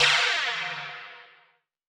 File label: Saucers, Alesis D4; Efx